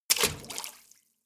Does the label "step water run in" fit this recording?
Yes